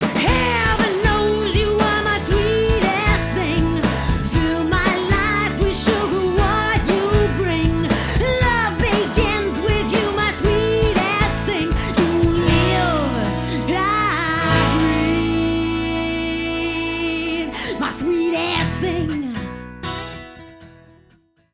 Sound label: acoust